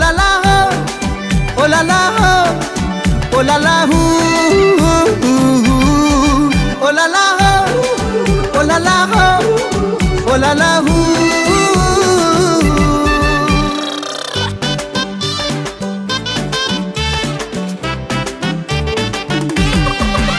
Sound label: Bengali ringtone